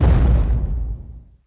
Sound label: Explosion; Recruit Ulta; Folk Hero